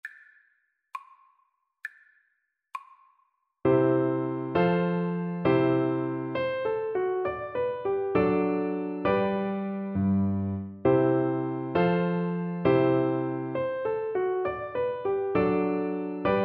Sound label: Gigue G Major, Flute